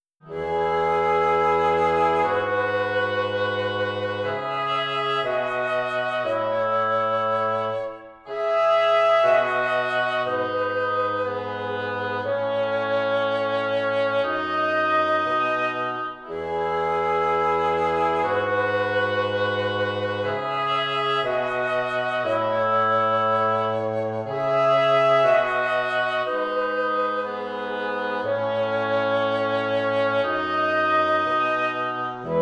Woodwinds Dry; illusion

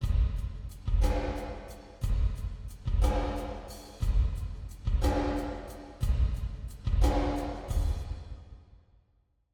Hall Drums Wet; Boston Hall A; Seventh Heaven Professional

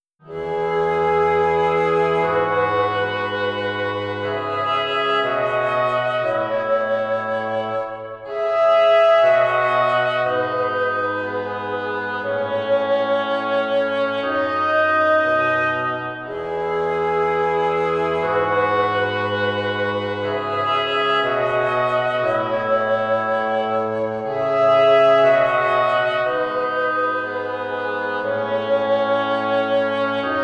Woodwinds Mix; illusion

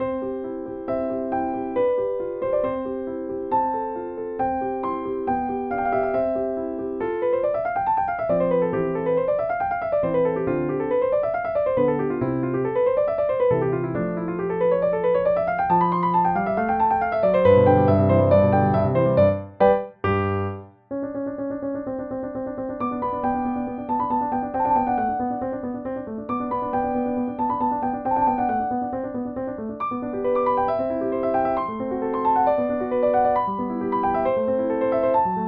Sonata C major - Dry; illusion

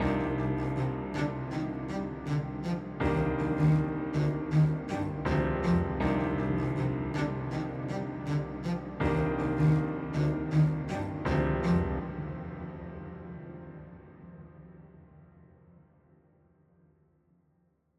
Piano Cello; Spaces2; Shimmering Sky; Seventh Heaven Professional

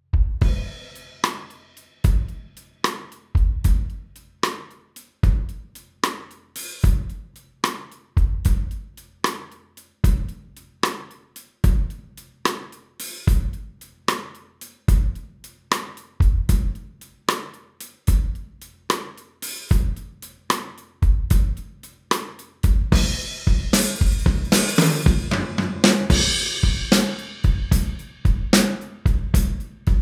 Drums Mix; illusion

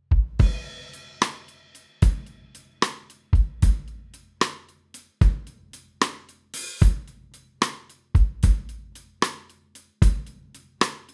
Drums Dry; illusion

